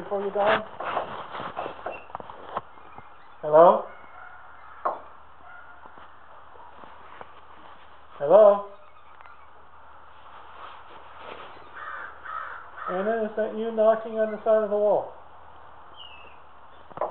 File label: stable knocking